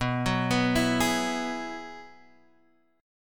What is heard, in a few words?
G sharp Minor 7th Sharp 5th B